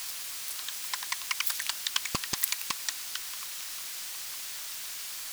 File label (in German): Mueckenfledermaus